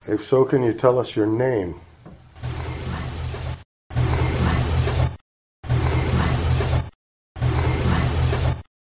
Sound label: Barn Evp; wolcott